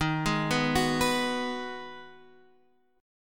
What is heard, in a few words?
Ab Minor 7th